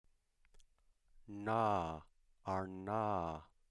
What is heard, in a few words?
voiced retroflex nasal